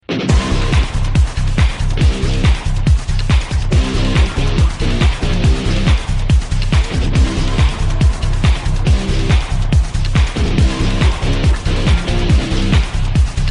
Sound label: remix